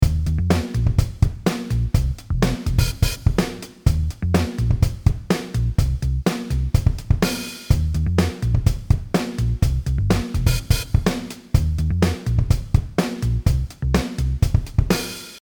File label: Kicks and splashes; DrBeat